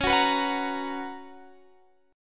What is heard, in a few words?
B Major 7th Suspended 2nd, chord